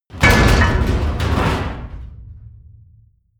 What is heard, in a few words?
hit metal